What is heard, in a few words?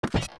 steps bridge land; zelda; OOT